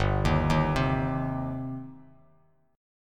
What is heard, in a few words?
G Suspended 4th Sharp 5th G down Bass Standard; arpeggiated